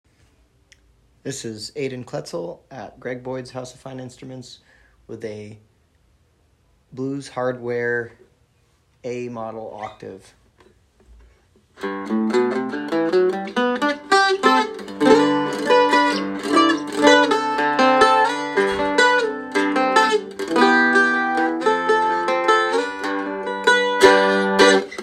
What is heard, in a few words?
Blues hardware octave